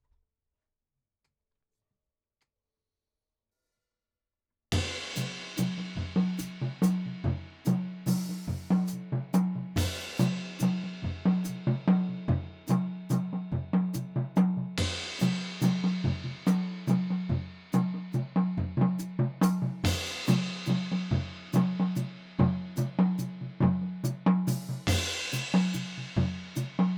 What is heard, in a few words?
strange home, drums